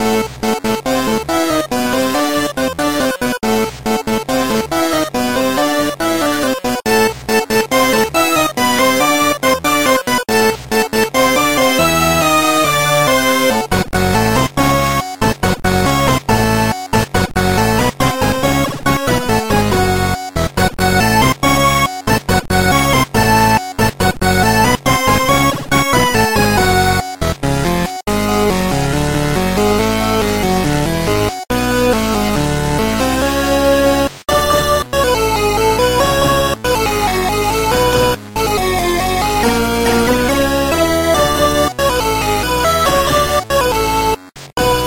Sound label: Boss 8bit